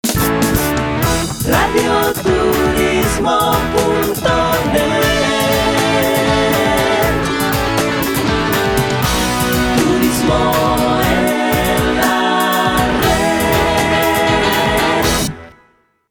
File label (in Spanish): radio turismo jingle